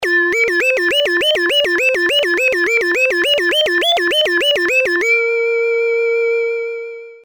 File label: tri fm glide